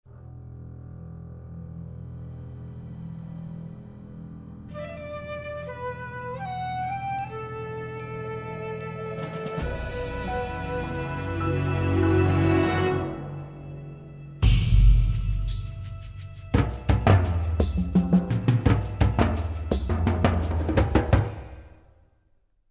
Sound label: Music